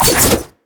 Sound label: rampage; LoL; hecarim